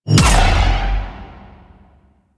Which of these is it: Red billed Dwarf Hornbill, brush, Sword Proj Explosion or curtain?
Sword Proj Explosion